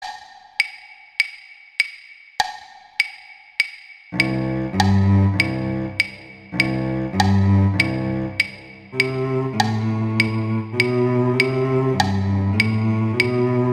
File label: Cello I; Duets; Duet 5; Mozart